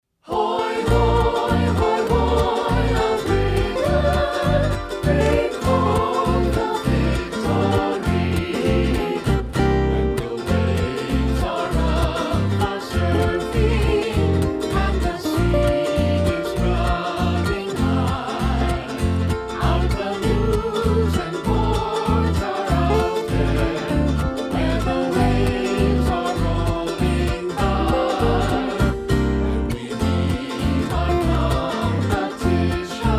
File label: Anthem, vocals; OCC